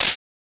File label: Clap Snare